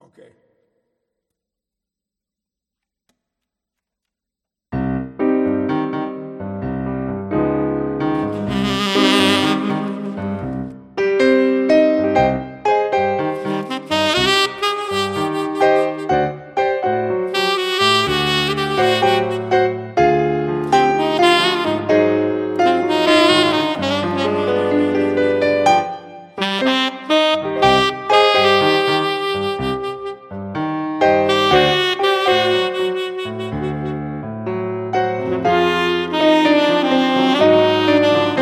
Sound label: PIANO AND SAX